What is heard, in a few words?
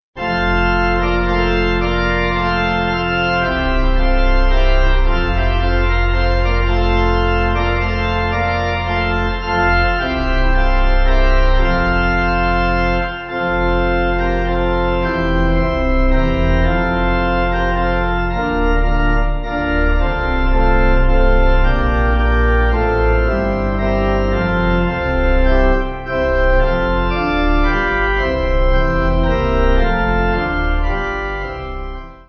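Pipe organ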